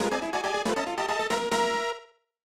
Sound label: Final Lap Warning